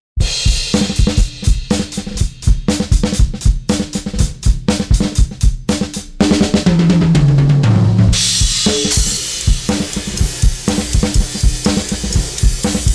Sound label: drum bypass; Gem Comp 670